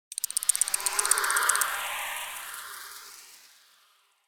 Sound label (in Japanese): zonbi